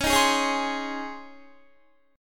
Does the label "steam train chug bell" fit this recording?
No